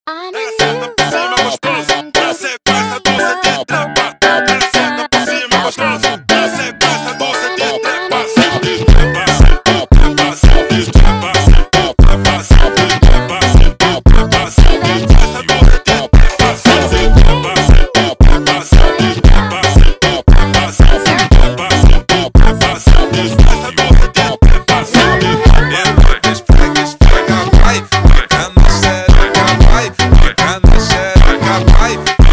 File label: New Soul Funk slowed